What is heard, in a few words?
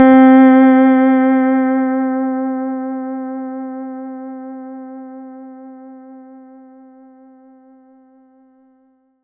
Kawai K5000W Hawaiian C4